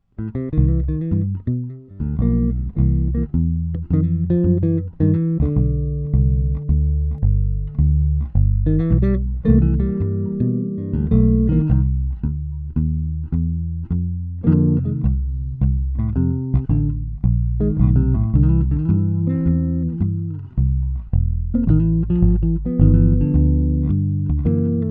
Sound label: basses